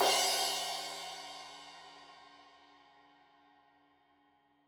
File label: Crash 15in L edge, Cymbals Stereo